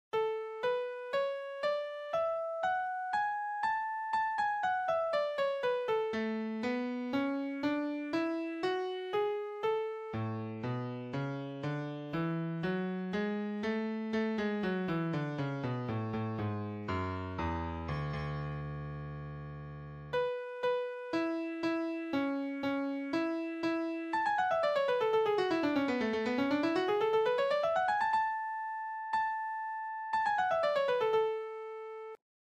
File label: Melody 4 A Major